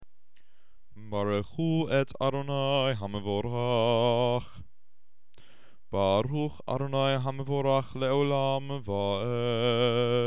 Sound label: blessing before the torah reading